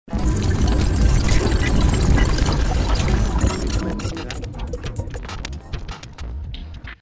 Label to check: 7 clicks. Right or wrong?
Right